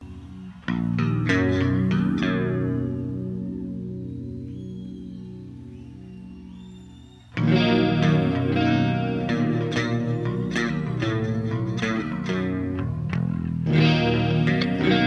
Bass Guitar Doodle

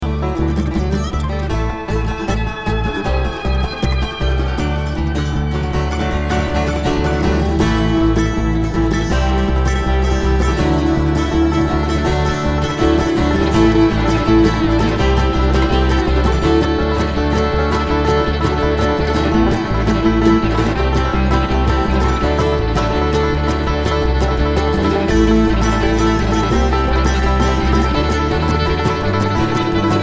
Traffic Jam

